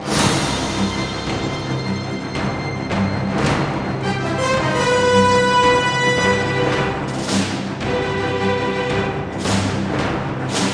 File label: Action; Brothers in Arms 2: Global Front